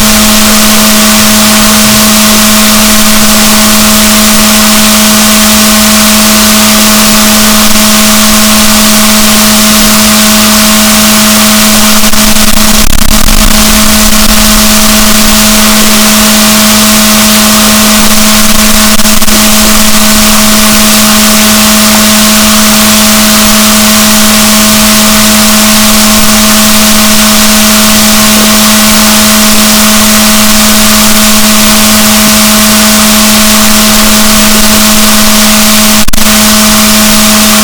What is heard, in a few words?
rorquals negatif, gaussian noise; Balaenoptera Physalus; SNR 24dB